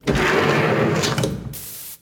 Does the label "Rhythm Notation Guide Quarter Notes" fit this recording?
No